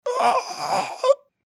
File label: Dying; Huntsman C